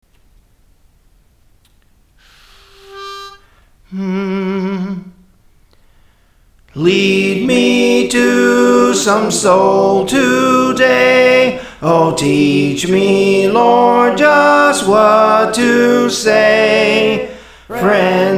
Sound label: Soprano, Songs; Praise For The Lord Training; Lead Me to Some Soul Today